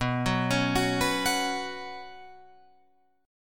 B Suspended 2nd, Guitar, Open F; arpeggiated; JGuitar

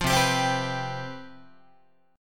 C Major 7th Suspended 4th, C, down, Guitar; strummed

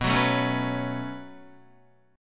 B 7th Suspended 2nd, down, Guitar; B7sus2; strummed; JGuitar

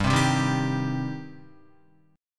G Suspended 4th Sharp 5th G down Guitar; Gsus4#5 strummed; JGuitar

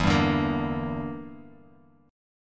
E Minor 7th Flat 5th E down Bass Standard

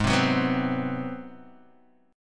G sharp 7th Sharp 9th, Guitar, Standard; strummed; 4,3,4,4,1,x; JGuitar

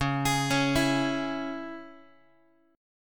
C sharp Minor, Guitar; C#m; arpeggiated; JGuitar

